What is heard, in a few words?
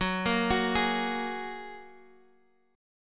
F sharp Major Add 9th, Guitar Half Step Down